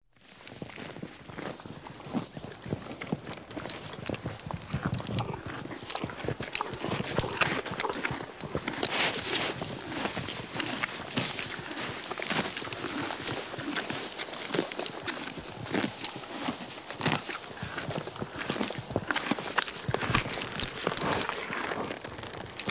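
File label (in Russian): лошадь ест траву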